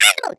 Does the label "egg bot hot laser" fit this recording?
Yes